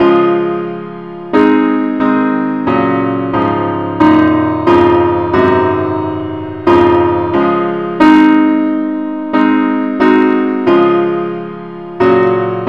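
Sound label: advance Accompaniment key C sharp; Gwalchmai; Glory be to God on high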